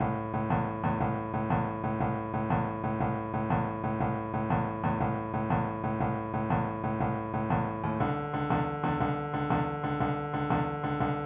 blues

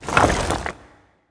rock shifting

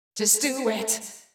VOICE GRL HOUSE 125 A, House Voice

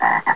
prop

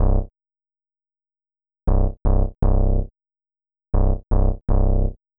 SNTH BASS AMBNT 160 C; Ambient

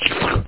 climb

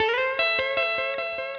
Rock and Roll Melisma, Rock Guitars; ACID Music; Tele Dreams

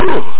oooha

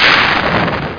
booster; 3dblast

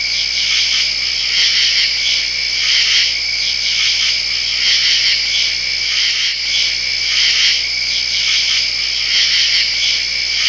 crickets loud; Hellboy

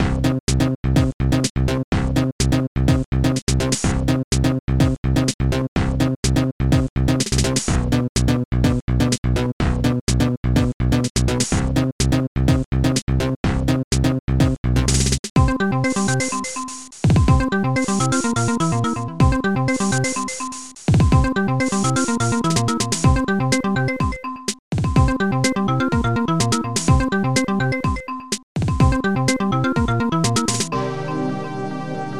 xylo, music; Especial Multimedia

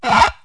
Rub Thumb Across Clean Plate; 1,000 Sounds (16-bit); Household; Kitchen

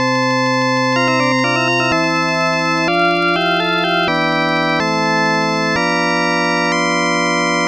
ORGAN; Louise's Last Modules

